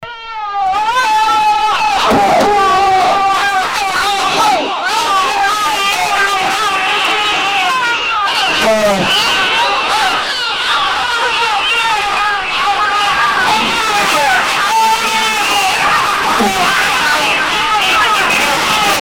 civilians scary and panic

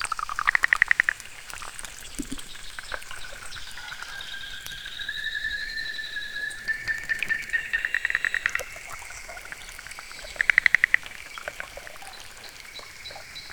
realistic 3d forest pond

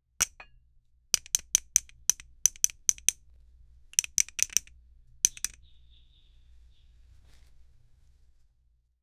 panch, punch

